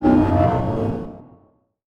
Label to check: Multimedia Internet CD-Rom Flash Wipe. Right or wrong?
Right